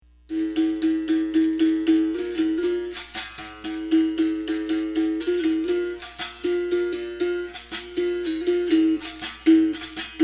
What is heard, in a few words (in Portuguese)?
toque santa maria, capoeira